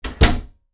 Door Close

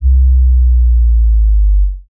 Low Descend, Sci Fi

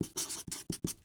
Write on Paper with Marker; Office Supplies

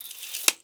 Tape Measure Close; Tools

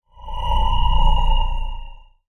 Shuttle Fly By, Sci Fi

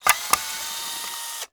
Cordless Electric Drill Tighten; Tools

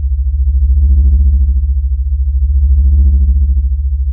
Large Power Source Loop, Sci Fi

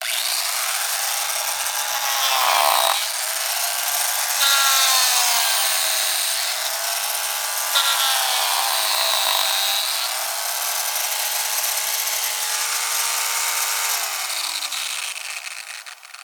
Roto Saw; Tools

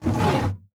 Desk Drawer Open; Doors & Drawers

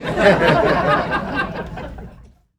Crowd Laughing; Vocals & Wallas; 1001 Sound Effects

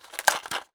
Box of Push Pins Opened; Office Supplies

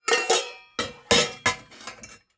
Pots Put Away, Kitchen

